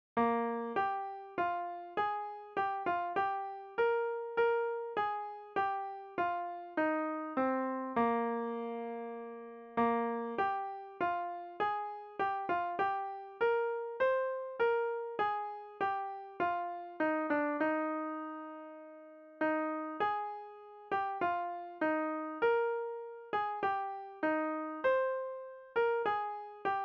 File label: hymn melody